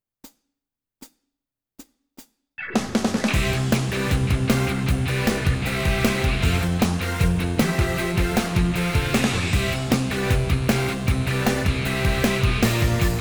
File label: rock Backtrack; vocaloid-contest 2014